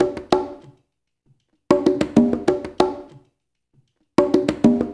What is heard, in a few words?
CONGAS; Spectrasonics; Liquid Grooves 1 & 2